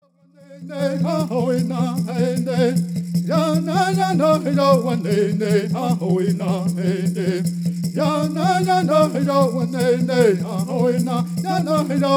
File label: Straight Song